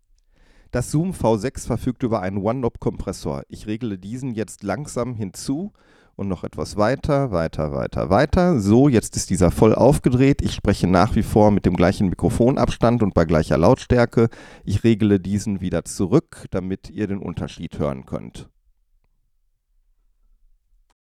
Zoom V6 Vocal Processor Compressor

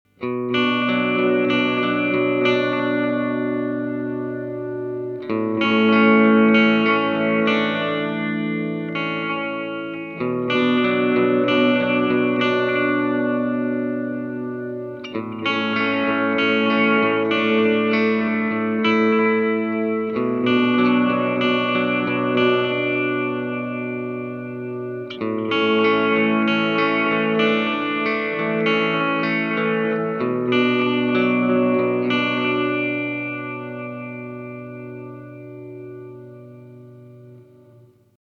Boss DM 101 Ambience

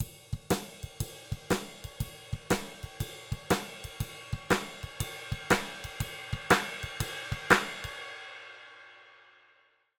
Drums MF 700 0 bis +15; RND Portico 551

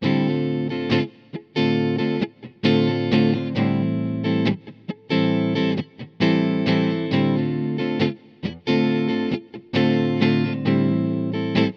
Roswell Splitted Neck Humbucker Clean; Tesla Pickups vs. Roswell

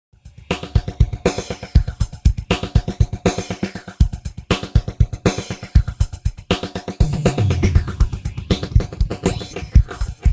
Drums Interpolation Delay Phaser; Radikal Technologies EFFEXX RT1701

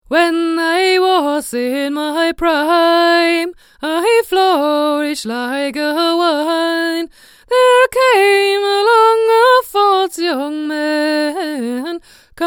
Sonotronics Saturn H1 near

